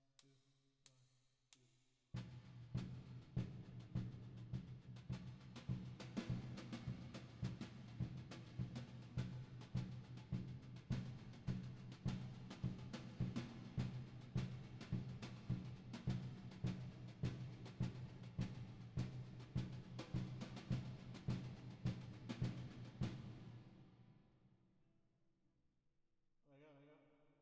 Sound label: Drums Verb